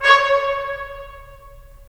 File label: BRS, Tps Stacc; Roland - LA Composer vol. 4